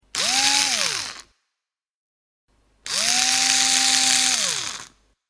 Electric Screwdriver, Whirring of an electric screwdriver; Power Tools